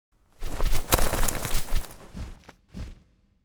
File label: Bird Crash Recover